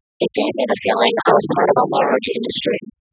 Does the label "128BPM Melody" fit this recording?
No